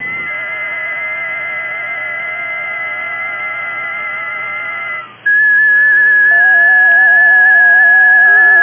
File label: FT4 sample